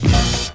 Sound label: Vinyl